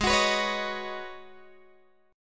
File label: G sharp Minor 11th G sharp Guitar Open G, chord